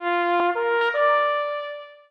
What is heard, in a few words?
taps